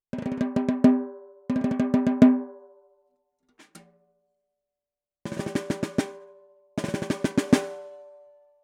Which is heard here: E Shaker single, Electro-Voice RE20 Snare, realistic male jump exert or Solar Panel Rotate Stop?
Electro-Voice RE20 Snare